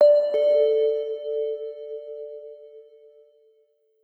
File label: Ding Dong Neu; Ring; alert tones